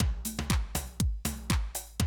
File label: Classic Drum Loops; Best Service; XXL 1500 Classic DrumLoops; Sample CDs